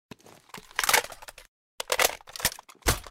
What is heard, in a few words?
rpk reload tactical; emx helios